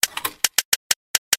Spear Move; don t be afraid the first toy

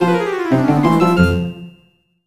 Rat Maze Game Fail

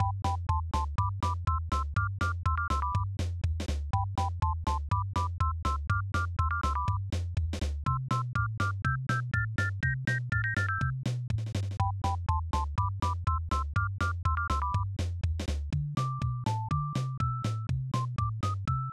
Level Music; Clock Works; SNES

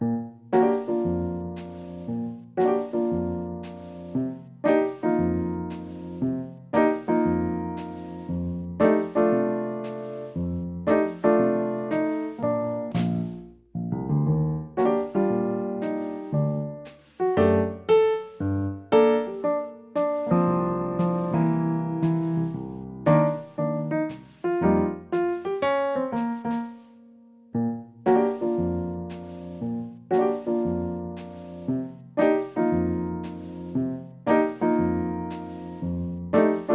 Photoalbum BGM

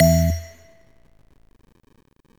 Train

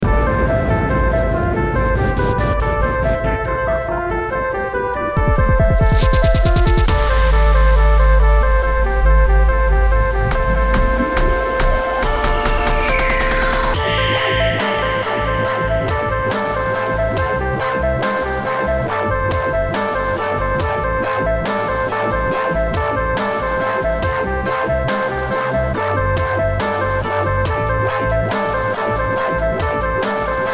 Remix

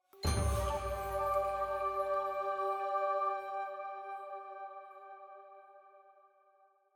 item Jingle Full Choir Flute G

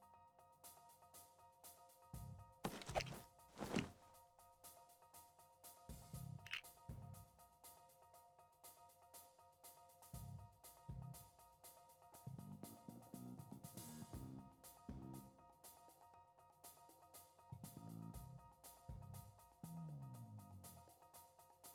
Newsreport Outro SFX; lego the incredibles 2018 switch; Cutscenes - Hubcitypark

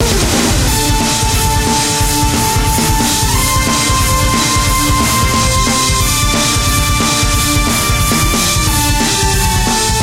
Battle; Destiny Fantasia RPG; android game music

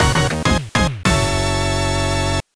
Professional Jingle

